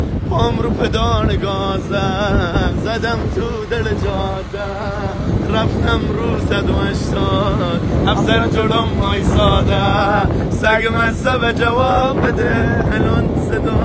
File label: Pedal Gaz